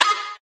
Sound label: wup